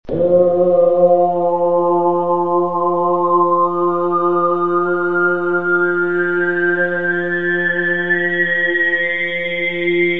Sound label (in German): bird vokal kreis